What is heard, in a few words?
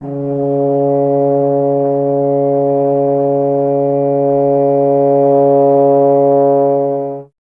BRS TUBA, Solo Instruments